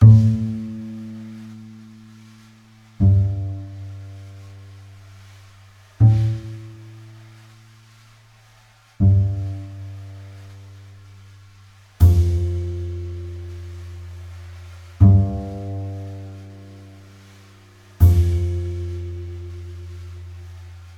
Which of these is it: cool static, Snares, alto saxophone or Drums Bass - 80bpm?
Drums Bass - 80bpm